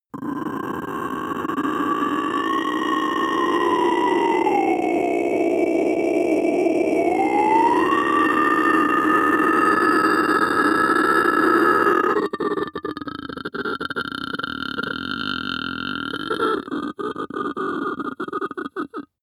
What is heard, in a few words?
male inhale grunt; koerpergeraeusche; menschen; soundpool